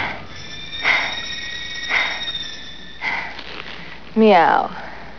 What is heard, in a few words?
MEOW